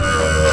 SCRAPE; E-MU Formula 4000 Series Vol. 2; Effectors

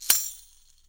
TAMSHA, EFFECT CYMB, 3D Drums; 3D Sound

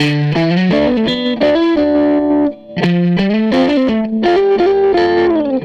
Strat Guitar Loop

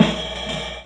Loop, Chamberlin Rhythmate; 300 Drum Machines; Processed

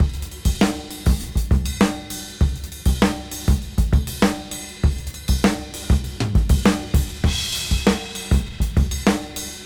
99 BPM; AMG; Keith LeBlanc Phat Butt Jams

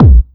126 DRM, Disco House

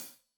Hat Loose, Hi-Hat, Cymbals Stereo

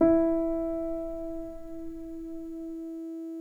PNO PP; Synclavier Sampler Library

